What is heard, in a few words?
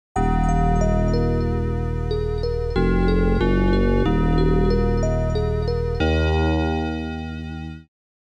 GAME OVER, Megadrive soundtracks; Sol-Feace; Internal Sound Source version